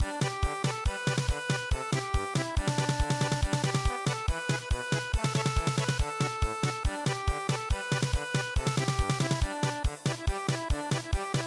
German Polka

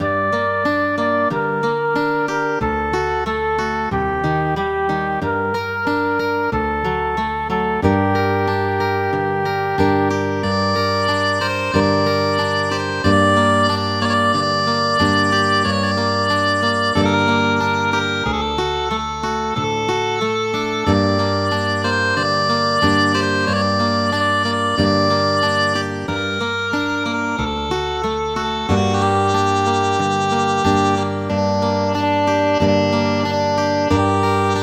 Folk Song

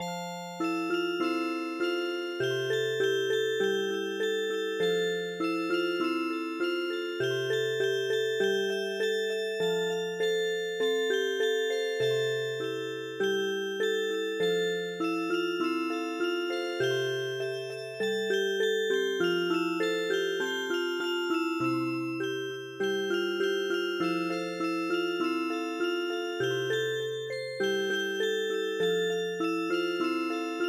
white gadarda Music Box

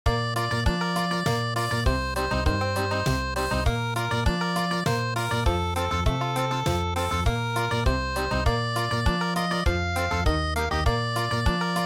Country, traditional; midi

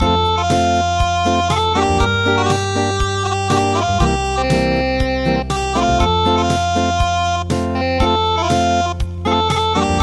Acoustic

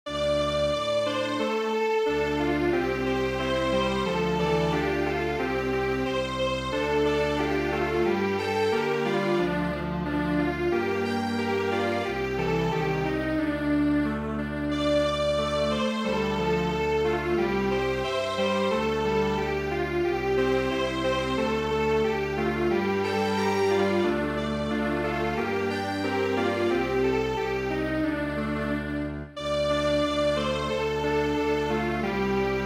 Strings Quartet, traditional

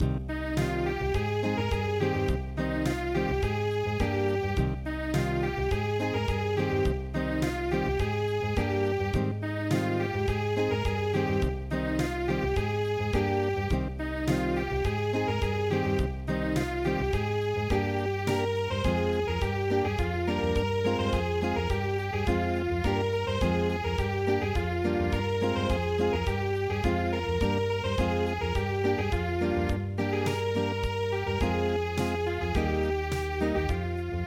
turkish flower Unplugged

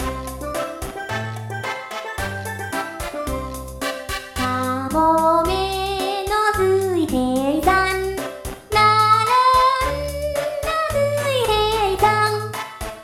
sea gull sailor, vocal; 鏡音リン

